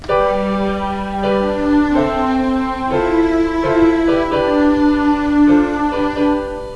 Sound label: Strings and Piano; MIDI Primer 1.0